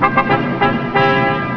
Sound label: high score; Tetris Max 2.3